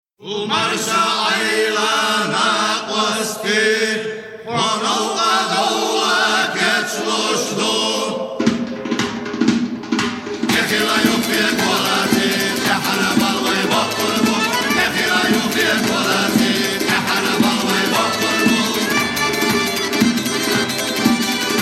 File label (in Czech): cecenska tradicni hudba; Zustante volne; chechen hudba